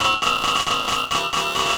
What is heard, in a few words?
philter (metalic); Sonic Foundry (Sony Creative Software); Ugly Remnants VOL-2